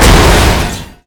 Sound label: medium crash, vehicles